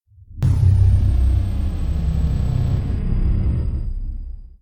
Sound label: Mysterious Crash; blindside